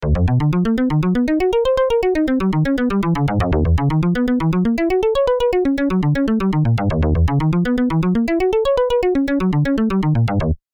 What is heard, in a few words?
Velocity trigger random note, velocity is being used to alter the pitch of an instrument; Here's the same line where velocity is being used to alter the pitch of an instrument.; MIDI velocity